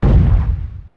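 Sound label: medium explosion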